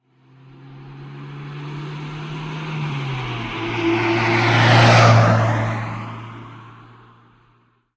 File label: car; Blind Drive